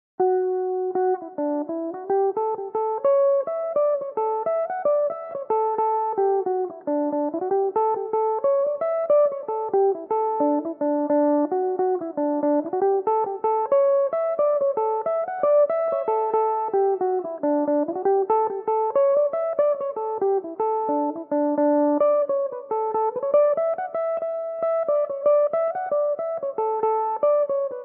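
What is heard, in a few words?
jig D major, Irish mandolin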